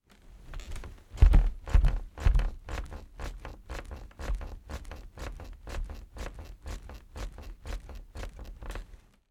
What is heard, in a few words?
household bunk bed wooden person jump bounce on top bunk recorded underneath